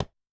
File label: Tabla M, Yamaha DTXpress-II, Perc